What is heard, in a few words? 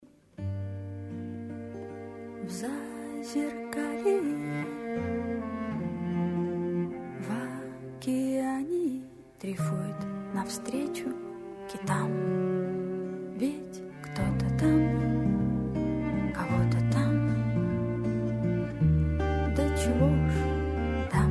tam tam